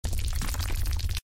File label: falling alert; Adventure Time - Break The Worm; ThrillGames